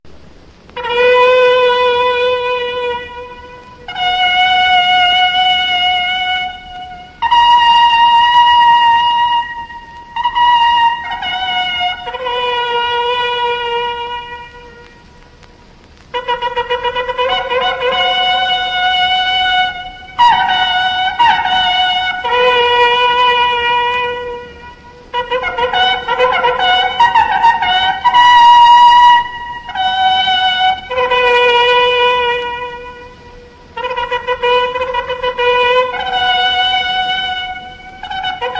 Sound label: silver trumpet